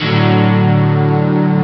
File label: stack; ST-XX Amiga Soundtracker Sample Packs